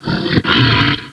revenant idle, zombie giant

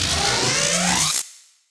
chainsaw charge, weapons

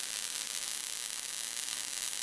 hammer idle

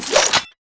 whip sword slash end

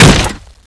wood, weapons

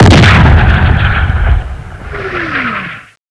missile; CSO Cronowing; cronobotics54

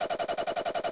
chainsaw spin, weapons

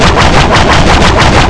whip sword stab loop all

